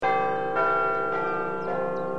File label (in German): beiern; lengerich